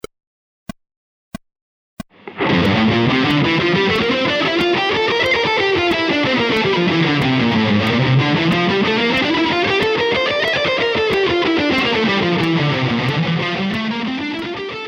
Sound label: scales combining rhythmic variations fast